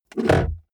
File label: car bmw e46 interior center console open